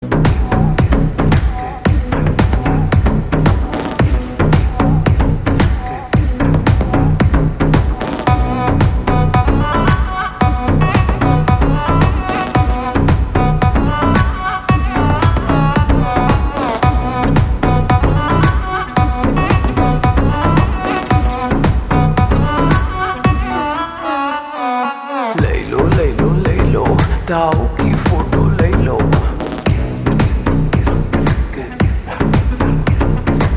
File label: Ringtone Slowed Reverb; pushpa 2